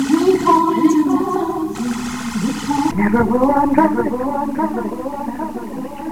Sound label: coins